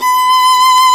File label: STR SOLO, String Master Series, Chamber Grp; Roland - String Master Series; Chamber Grp1